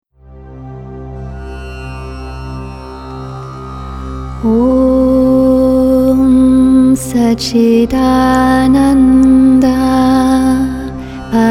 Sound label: Arati 3x Moola Mantra